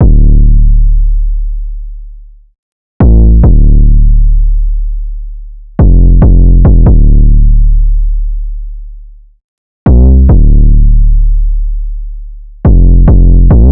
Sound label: 808 loop 140 Dm; Slate Digital BASSHOLE Sample Pack; 808 Loops